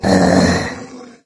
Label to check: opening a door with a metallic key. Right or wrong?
Wrong